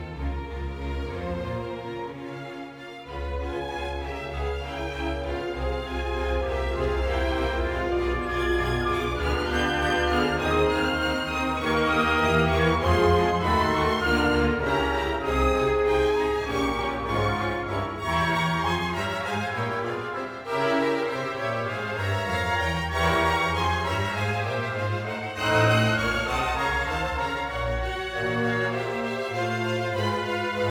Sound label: Piano Concerto No. 2 in F Minor, Maestoso